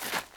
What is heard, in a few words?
gravel wander, step